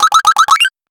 arpg game upgrade sound; achievement

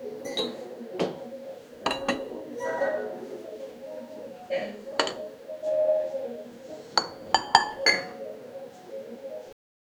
seamless loop of restaura

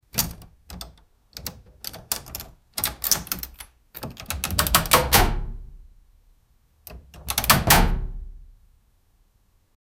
extreme close up the rust, door

